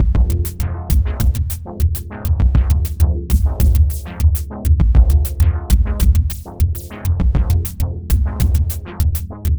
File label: Shuffle Shift Beat; Sonic Foundry (Sony Creative Software); Ma Ja Le Ethereal Textures; Beats